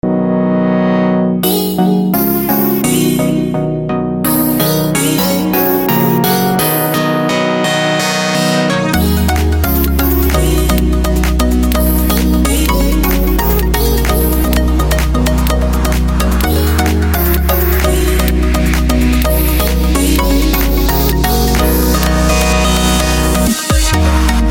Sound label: intro house thingy